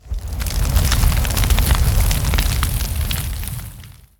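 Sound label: Flame Breath Sweet; Evolve; Goliath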